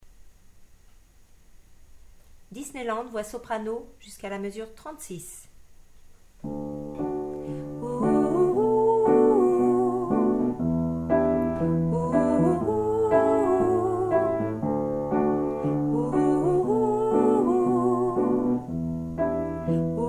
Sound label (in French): soprano